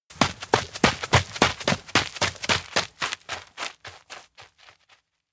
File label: steps outdoor forest run; anemoi